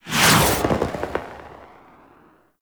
Blind Arrow Whiz By; Powers; Fey; Saboteur

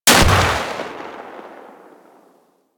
Fire Shot, Weapons Lever Action Rifle; Warframe; Tenno; Sybaris Prime

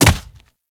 Infested Charger Step Default, Footsteps

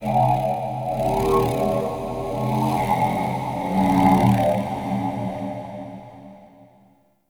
Root Cave Didge Texture; Ambience; Neathuns Root Cave; One Offs

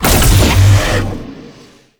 Race Gate Hit; Warframe; Tau; Circles Of Hell

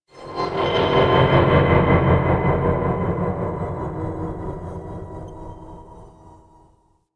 Metal Groans And Scrapes, Asteroid; Ambience; Warframe; Grineer Asteroid

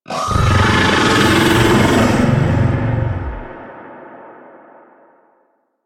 Arachnoid Camper Vocal Death; Warframe; Corpus

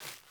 Sandals Run Vegetation, Footsteps; Characters; Player

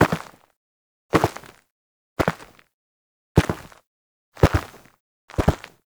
Walk Forward Steps, Forest Night; Warframe; Sacrifice; Ballas

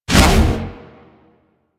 Roulette Jam Burst; Warframe; Cowgirl

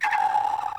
Creature; Corrupted Ode Two

